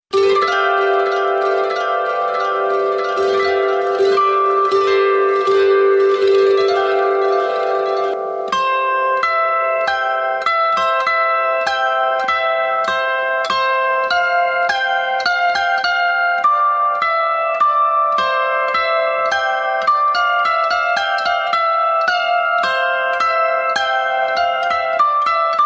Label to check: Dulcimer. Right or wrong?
Right